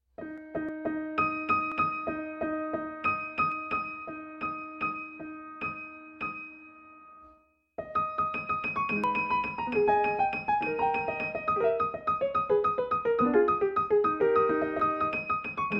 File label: Steinway D Studio Grand; La Campanella